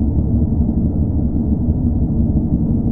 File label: ship thrum